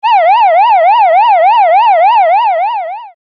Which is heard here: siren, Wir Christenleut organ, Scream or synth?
siren